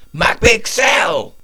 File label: fanfare acapella